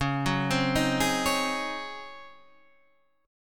C sharp Major 7th Suspended 2nd Suspended 4th C sharp